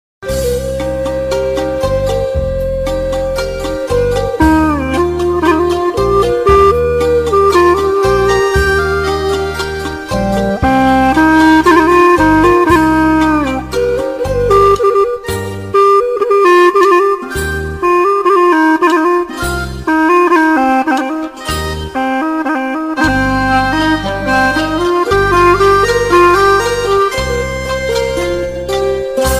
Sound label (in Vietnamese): Tiếng Sáo Mông